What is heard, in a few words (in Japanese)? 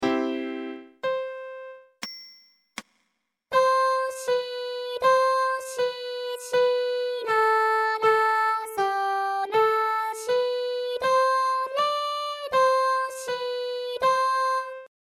ミックス音声(L：ピアノ R：ボーカル); コールユーブンゲン No.4 b)